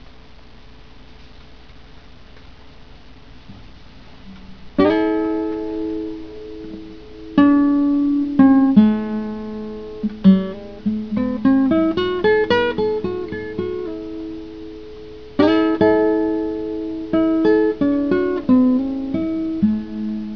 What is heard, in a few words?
blues